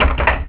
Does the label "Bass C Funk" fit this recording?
No